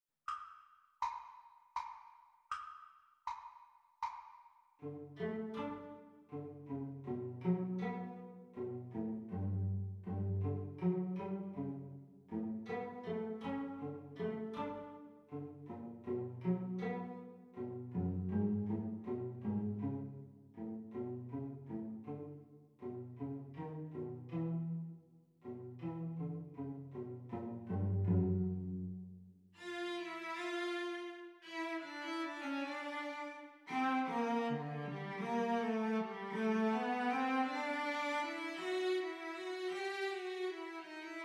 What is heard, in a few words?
Cello Duet Playalong Romantic Dream; Exam Speed; The Teacher part